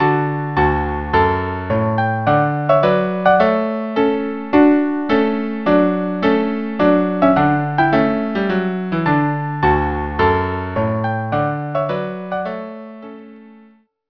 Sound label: trio